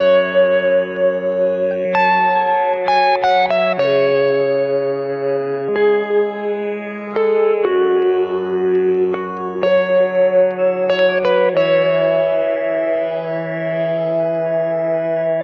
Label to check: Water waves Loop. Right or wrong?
Wrong